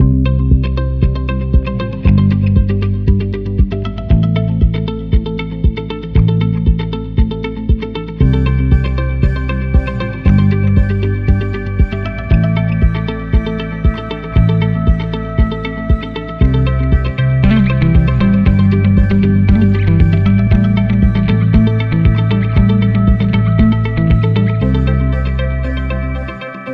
Sunset Indie 808

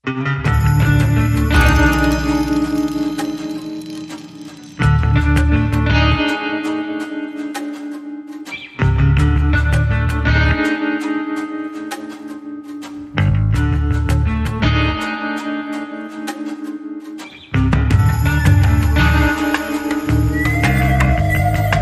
Minimal

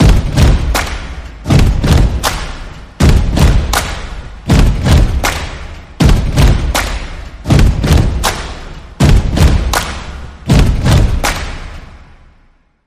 Fan Rhythm

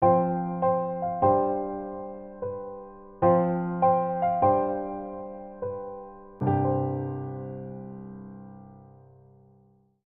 Introspective Piano Etude

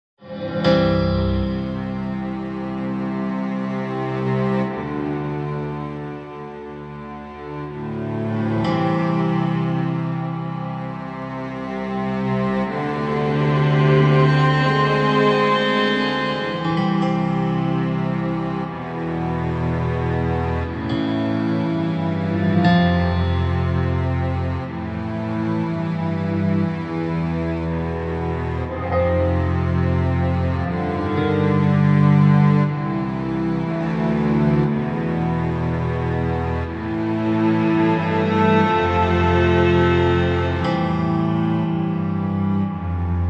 Ghostly Cello

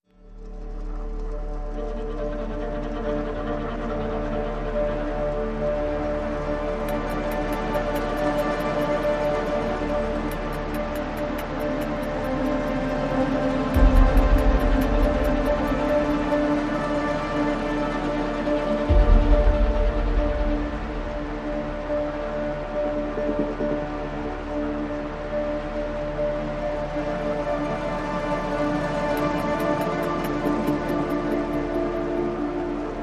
No Lead Strings

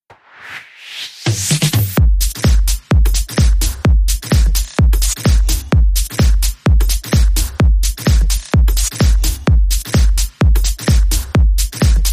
Beat

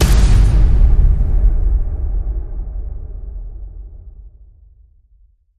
Hit Big Air Mobile

